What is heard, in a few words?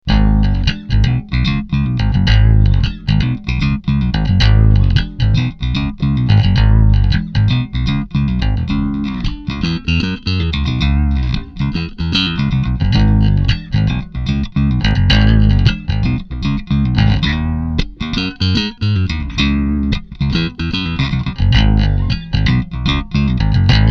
riff